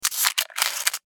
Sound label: Tech gadget